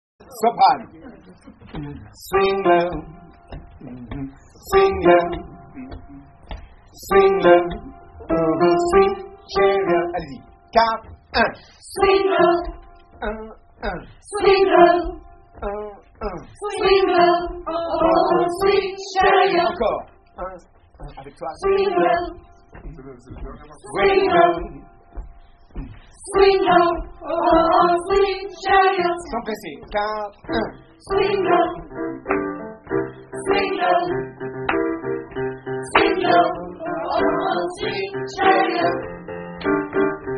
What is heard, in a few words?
Swing low soprano; Soprani